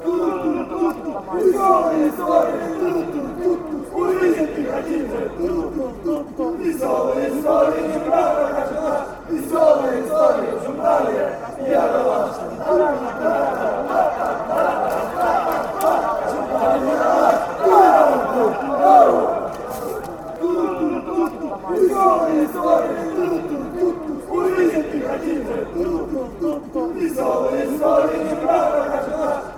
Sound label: chant, fanchants, ukraine; metalist